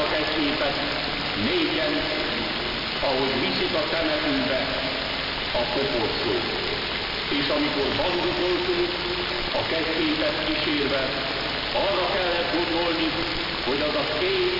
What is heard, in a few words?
am, hungary; 675.000